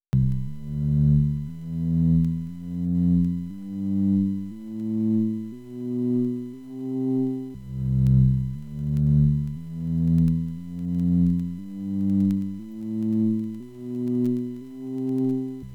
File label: String octave2 bowed, Synthesis; DE1 SOC; DSP